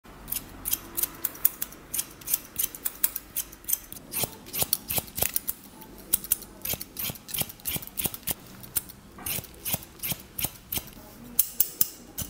hair cut asmr; اسمر کوتاه کردن مو

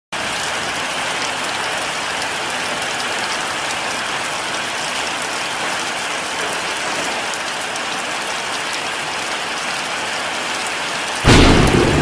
Rain on roof

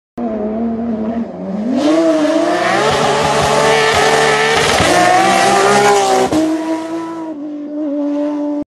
kmc, اگزوز ماشین

